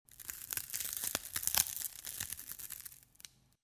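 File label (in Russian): треск при осторожном надавливании на лужицу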